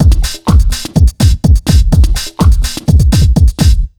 BEAT, Disco House; USB Soundscan vol.20; Fresh Disco House I; AKAI

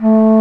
WOODWINDS

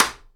SIDE STICK, Live Snares

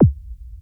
TECHNO PERCS; Crypton - DrumBase5000

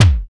DANCE SET, DRUMS

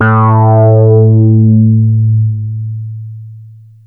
FUNKY, BASSES; Masterbits - Vintage Voltage; VV BASSES; FUNKY PAVE

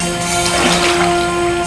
WET, WATER; AKAI S6000 CD-ROM - Volume 1

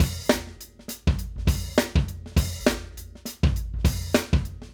Acoustic Live Drum; Platinum Audiolab

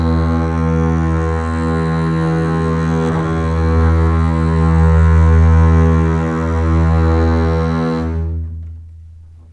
E2 LEG F, String; Ultimate String Collection

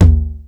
909 tom low; 300 Drum Machines; Yamaha Motif ES-Series

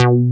PRO1

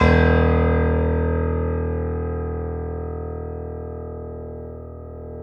C7 F, The Ultimate Piano Collection; East West

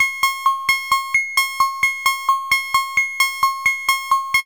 RHYTHM FM

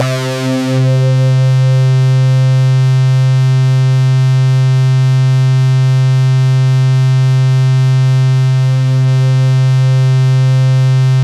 C3, SynthLine; 90 s Sample CDs; NiceBeats; SynthLine VOL-33

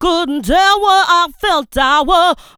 Funky Vocals; USB Soundscan vol.18; AKAI

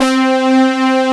SYN BRASS C3; E-MU Producer Series Vol. 2 – More Studio Essentials; Composer; Sweet Brass

